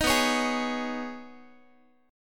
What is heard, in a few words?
B 6th Add 9th B Guitar, chord; B6add9; strummed; 18,19,16,18,x,x